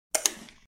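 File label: bar shaker sounds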